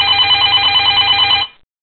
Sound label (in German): effekt telefon